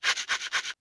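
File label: scratch; XBOX; Crash Bandicoot The Wrath Of Cortex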